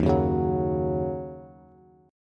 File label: Eb Minor 7th, down, Bass; strummed